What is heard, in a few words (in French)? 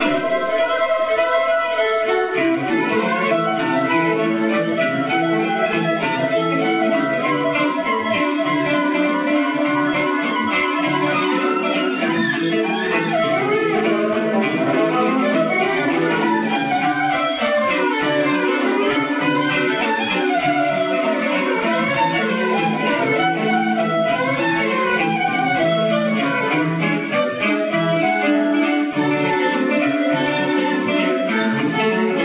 solo violon